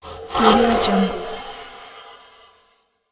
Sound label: saw slice